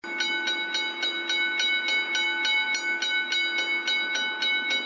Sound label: SMS